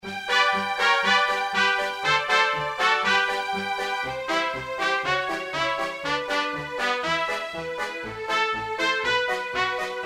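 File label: circus intro background music; Crazy Circus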